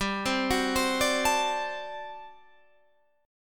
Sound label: G Major 7th Suspended 2nd Suspended 4th G down Guitar Half Step Down; GM7sus2sus4